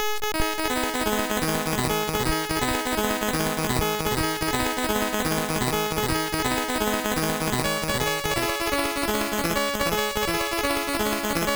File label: Acid Power, MOS6581R3